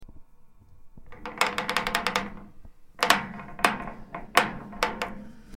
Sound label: Metal Bending